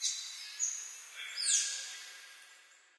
amb african birds; source engine; portal2

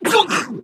ballbot pain hard surface

